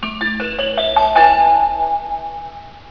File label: yamanote melody; Linea Yamanote; VARIOS JAPÓN 2005 - MÚSICAS DE LOS TRENES